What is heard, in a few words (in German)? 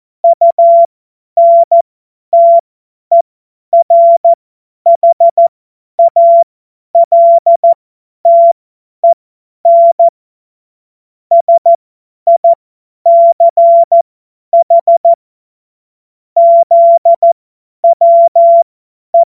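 Witz, Morsekurs, cw morsen; Witze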